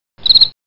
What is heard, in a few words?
cricket